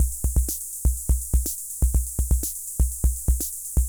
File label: Ace Tone Rhythm Fever Loop; Drum Machines; 300 Drum Machines